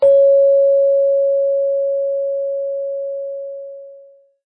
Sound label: chime